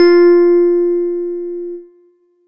FM tine F; Polyend; ARC Noise

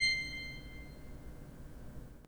PIPE, 3DOrgans

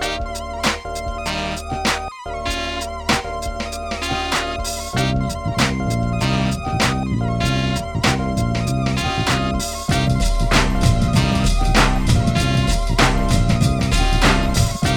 96BPM, Hip Hop; InVision Interactive; 40 Oz Phat Slammin

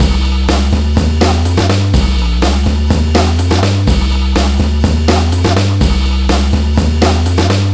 DRM 124, Breakbeat; Best Service ProSamples vol.24; AKAI

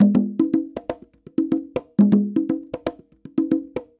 Congas 120 bpm, African Drums; Quazibeat; African Secret

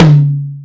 Tom Mid, Alesis Hr16b, Drums

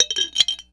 JAR CLINKE; Rarefaction; Poke in The Ear With A Sharp Stick

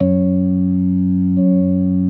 B3 F#3; Best Service; Hyper Dance

